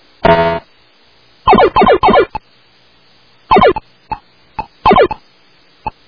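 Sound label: manipulations with a ball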